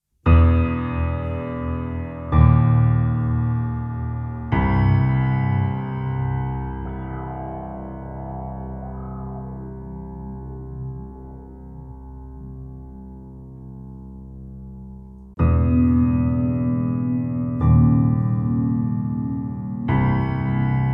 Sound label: The virtual piano